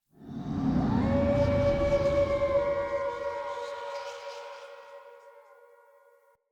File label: Diorama Whoosh In; Cinematics; Orengall Quest; Cub Diorama C